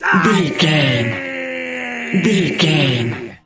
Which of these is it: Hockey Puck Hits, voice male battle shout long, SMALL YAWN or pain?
voice male battle shout long